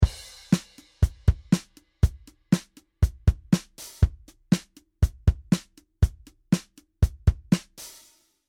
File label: ezd3 tight; EZ DRUMMER 3